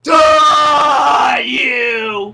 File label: alert